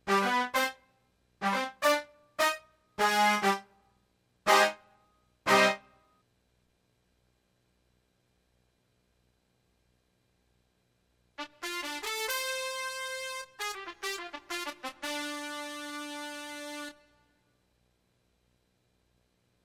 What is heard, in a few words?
brass